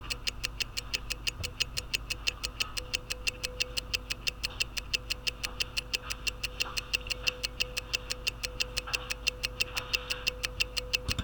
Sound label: Tick Tach Mic Test 21600, watch; timegrapher mic for watch enthusiasts